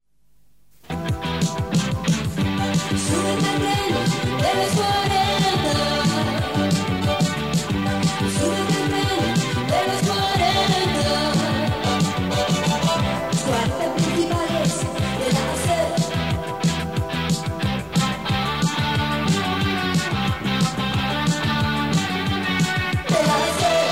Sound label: jingles; 40 Principales